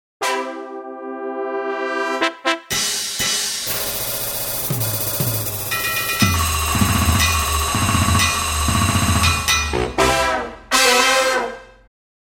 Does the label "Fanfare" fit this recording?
Yes